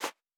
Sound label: Footstep Sand Running